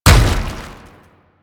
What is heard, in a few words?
Babau Step; Enemies; Warframe; Proto Infested